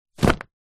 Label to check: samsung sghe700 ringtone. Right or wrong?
Wrong